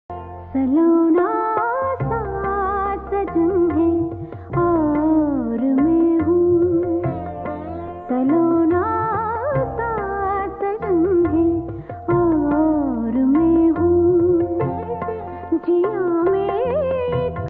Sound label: old song